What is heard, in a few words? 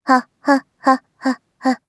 laugh, JPN; Eternal Return; Katja